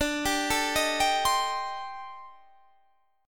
A-Minor 7th Flat 5th D, chord; Am7b5/D arpeggiated; JGuitar

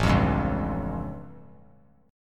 A Augmented 7th A; strummed; JGuitar

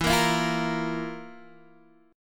F Major 7th Suspended 4th E

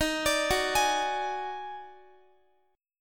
Eb Minor Major 11th, down, Guitar; arpeggiated; 4F,4A,5C,5G,5C,6E; chord; JGuitar

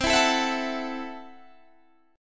C 6th Flat 5th, C, down, Guitar, DADGAD

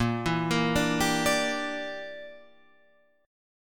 A sharp 6th Flat 5th chord

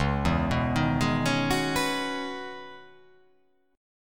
C sharp Minor 11th C sharp

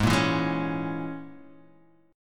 A sharp Minor 7th Flat 5th G sharp

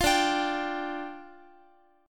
D Minor Double Flat 5th, down, Guitar; Dmbb5; strummed; JGuitar

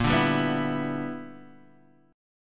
E Minor 7th Bb; strummed

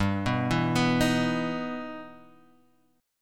E Minor Major 7th G

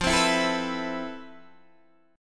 F Major Sharp 11th Guitar DADGAD, chord

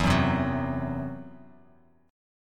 E Suspended 2nd Sharp 5th E, down

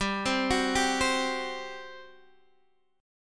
G Major 7th Suspended 4th, Guitar, Drop D; down

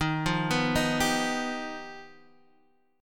G Augmented 7th

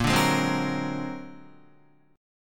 A sharp Augmented 9th A sharp Guitar; A#+9 strummed; JGuitar

